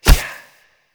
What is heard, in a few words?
Choir Bow Quick Fire; Warframe